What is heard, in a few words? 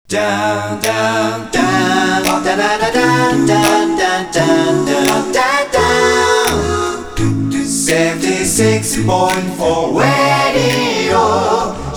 Chorus Version